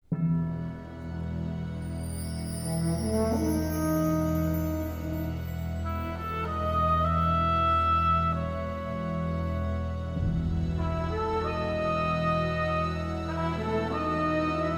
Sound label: Fanfare; Echoes of the First Dreamer the musical prequel to Golem